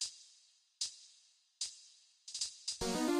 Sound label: gameover intro; Cave Story Repainted